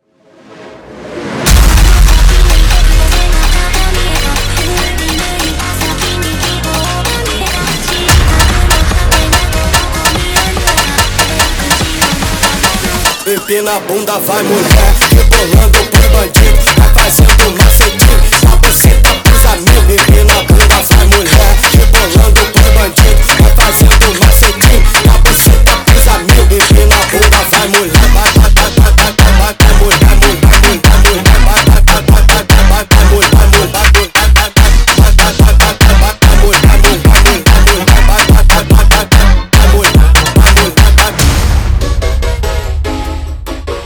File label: Vocaloid Funk